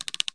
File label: zoom, weapons; czero opforce